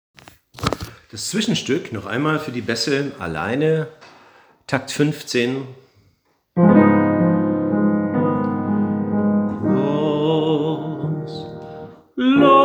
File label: Bridge Bass Ladder